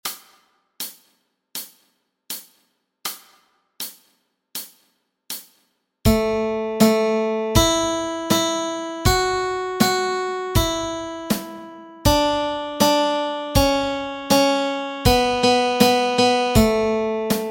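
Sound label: Melody Track, Easy Guitar and Ukulele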